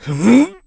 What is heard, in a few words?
Sumo Grab; Sumo match: Bo grabbing Link